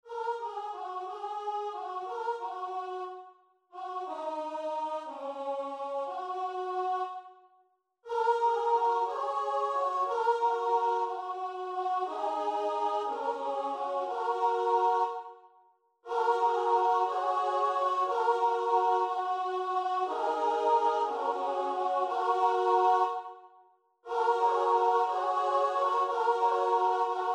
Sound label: MIDI audio